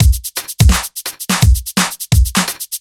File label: Kit Heavy Swing, 85bpm; off the grid